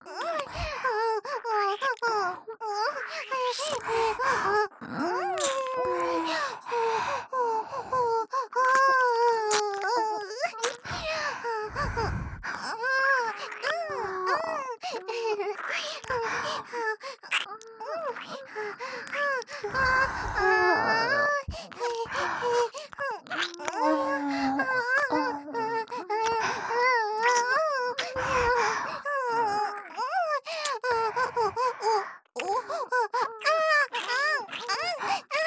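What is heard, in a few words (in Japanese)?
演技喘ぎ＋片耳舐め; 美冬; 小春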